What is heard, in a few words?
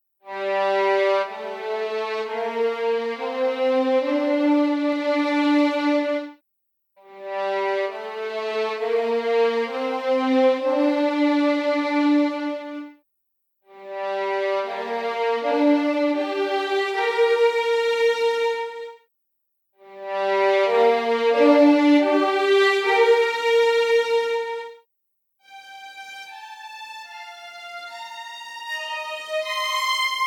First Violins Test Legato All Mics; LSS